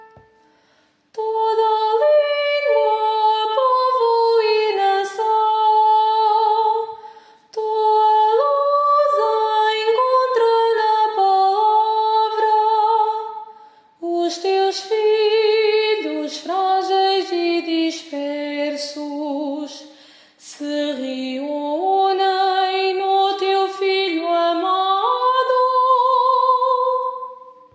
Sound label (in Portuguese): Hino Jubileu Estrofe Soprano